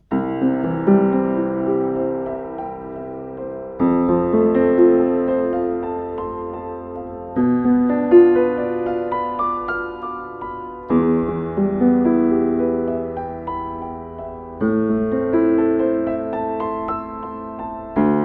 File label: PIANO AKG C414XLS